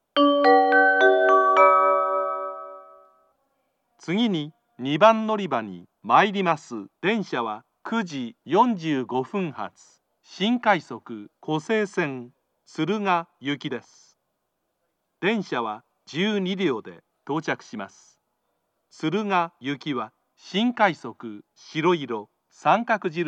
special rapid service, kyoto; tsuruga

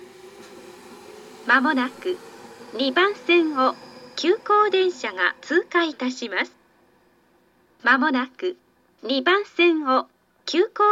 tsukushino pass express, tokyu station; つくし野駅 2番線; 接近放送; 通過; 急行電車通過時の放送です。; tokyu-corporation

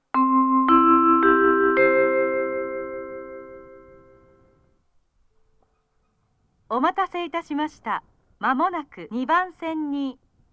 rapid airport; eniwa; jr-hokkaido; otaru

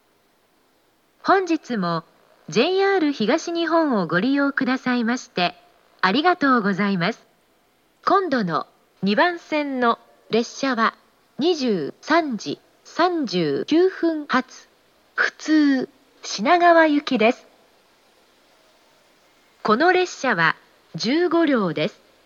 tsujido local shinagawa last, station; jr-east

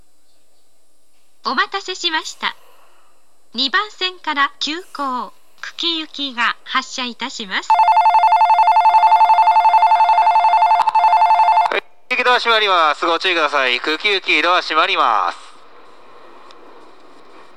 express, shibuya station; kuki

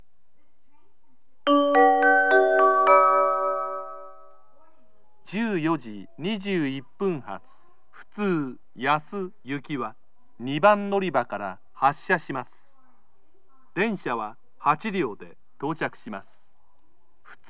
local, kyoto, station; yasu; jr-west

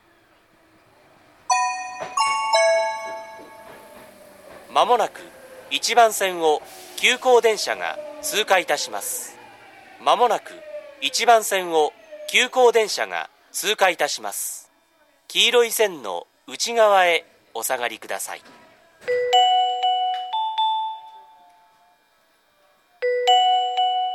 pass express, station; kuhombutsu

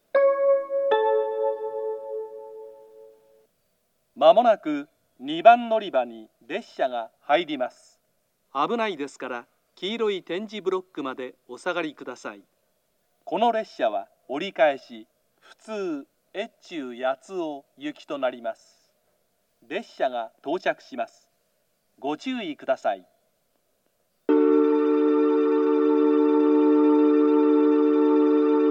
toyama turn local; station; jr-west; etchu-yatsuo

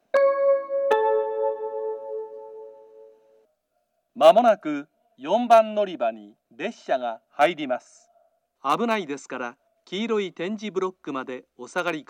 toyama turn local; 普通; 糸魚川; 折返し、普通 糸魚川行です。; station; jr-west; itoigawa

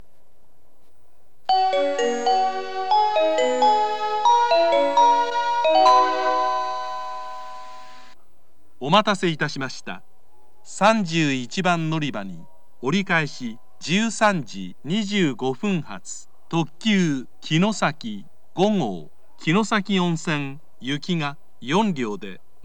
turn limited express; kyoto; kinosaki-onsen

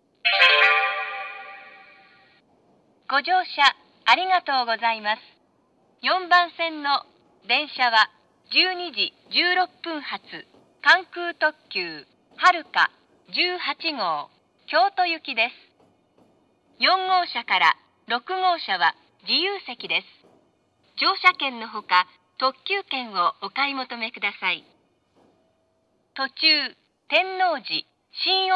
kansai airport limited express, station; haruka18; kyoto

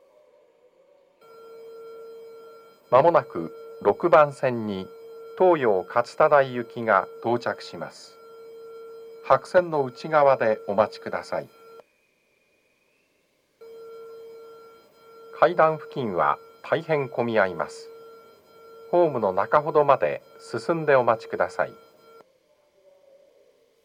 nishi funabashi toyo katsutadai rush, station

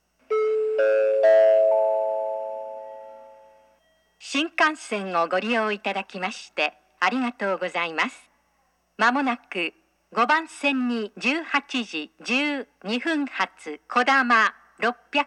kakegawa kodama, station; jr central

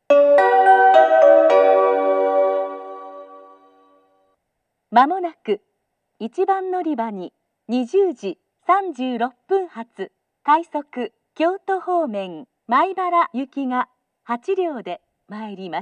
rapid; ashiya; maibara

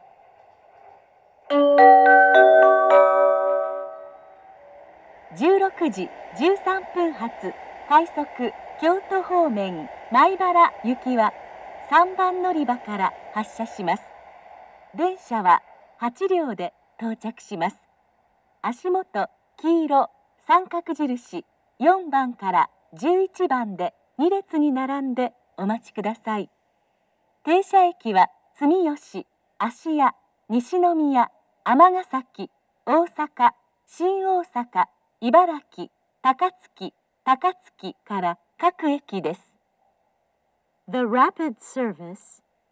rokkomichi rapid maibara; 六甲道駅 3番のりば; 接近予告放送; 快速; 米原; 16時13分発、快速 米原行 8両編成です。; 停車駅は 住吉、芦屋、西宮、尼崎、大阪、新大阪、茨木、高槻、高槻から各駅です。; station; jr-west

